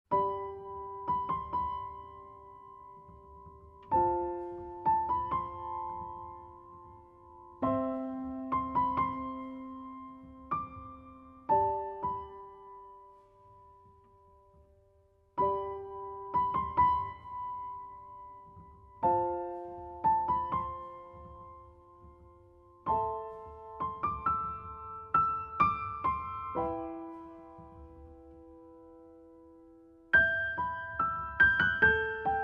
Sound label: Fresh leaves (piano version); Hana Yori Dango OST2